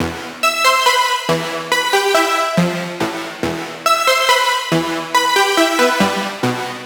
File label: Riddim Synth Loop E Creeping 140; Ghosthack; Riddim Essentials 2020